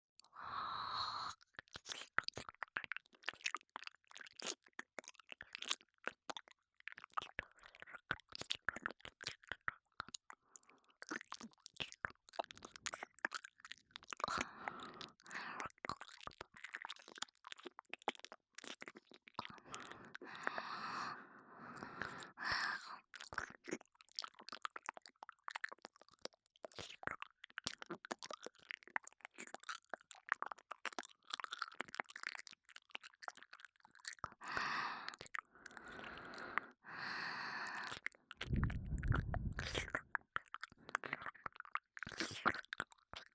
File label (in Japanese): 両耳舐め リピート版, ASMR